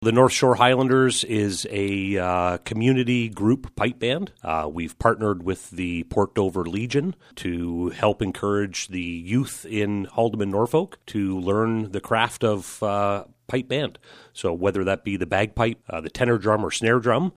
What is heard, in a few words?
bagpipes